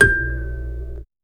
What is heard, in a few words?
kalimba mbira thumb piano